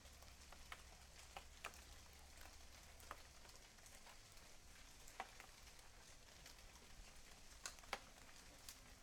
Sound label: rain loop light; sonniss gdc